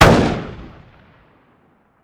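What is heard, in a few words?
weapon ar fire player atmo exterior; gmod; viper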